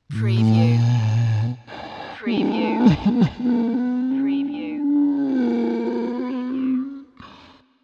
Ghostly Sob

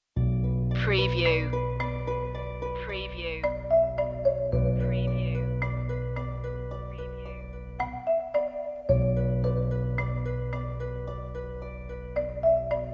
less overall percussion

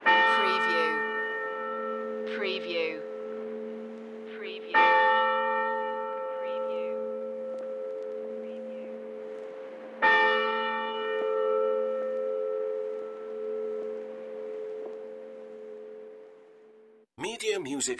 Mission Bell Tolling

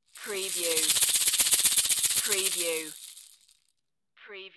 Caxixi

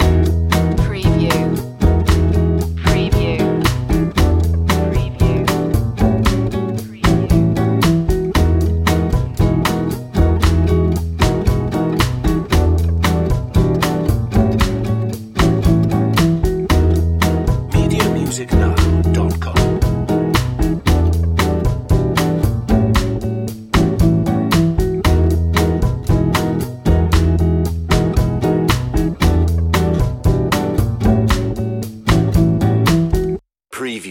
bossa groove

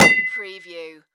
Metal Strike Hit Ring

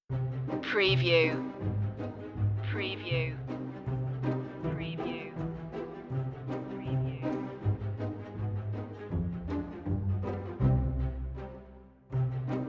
spooky adventure strings only

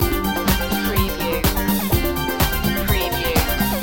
disco loop

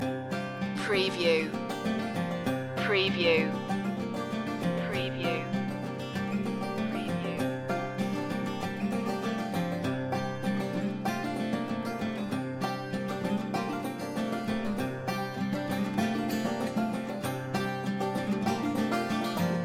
folk loop

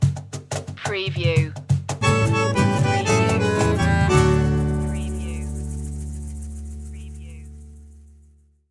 chamame stinger

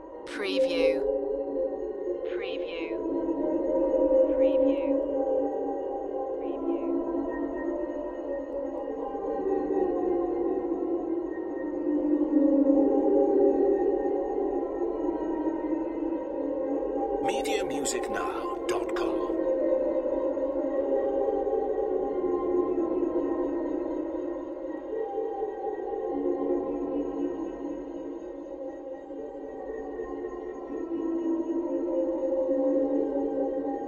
whiny ghostly background loop

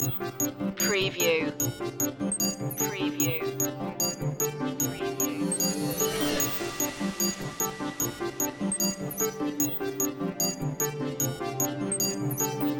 digital clockwork loop

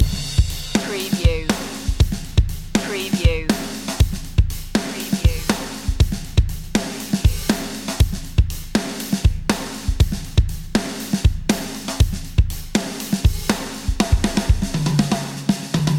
funk soul king loop

